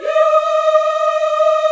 D#5, CHOIR YAS, Africa; Spectrasonics; Heart of Africa 1

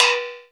R8 Satellite, Drums

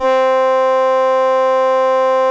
LAMBDA, String Machines

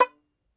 T. BANJ B4, Tenor Banjo; Plucked Strings; E-MU Producer Series Vol. 7 – Old World Instruments

